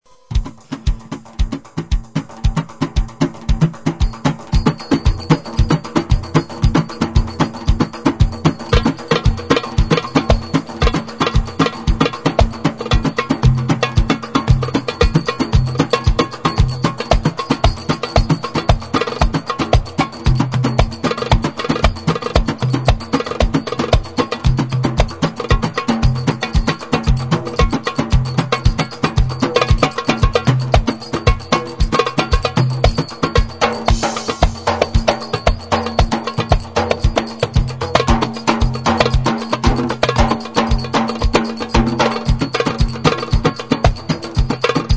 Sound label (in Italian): Danza Ventre tabla